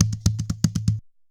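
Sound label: kalabass groove; Best Service; World Colours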